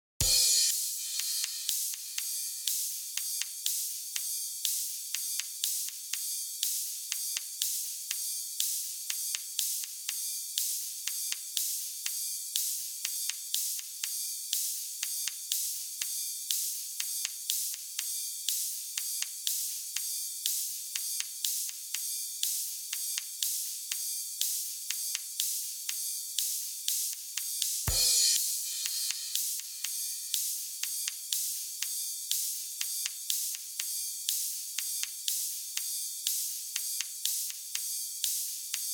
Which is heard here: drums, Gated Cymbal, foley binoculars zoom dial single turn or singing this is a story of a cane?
drums